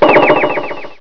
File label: disappear; Lost in Space